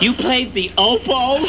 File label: oboe; You played the oboe?; Encore! Encore!